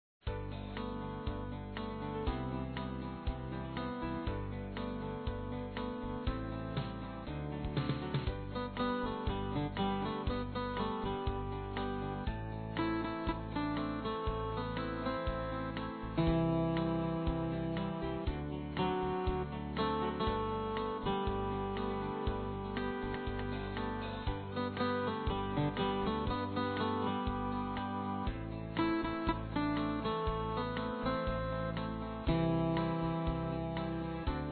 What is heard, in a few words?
Welcomed Poems Guitar